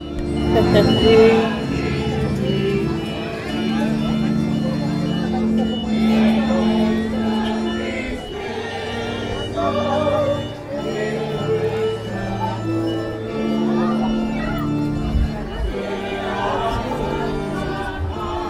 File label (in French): Sucre messe en plein air; Bolivie